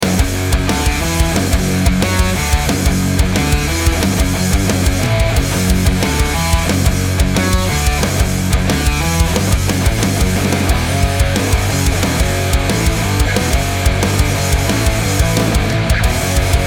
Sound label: metal energy 90 bpm short